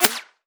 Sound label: bullet whiz; Escape from Tarkov